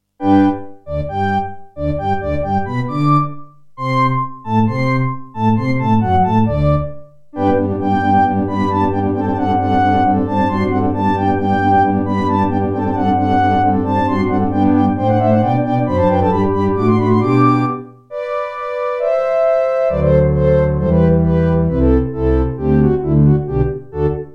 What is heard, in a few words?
Serdaco McFly; Mozart - Eine Kleine Nachtmusik partial; classical